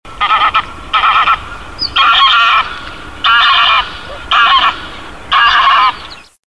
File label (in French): oie cendre